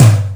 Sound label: Tom Room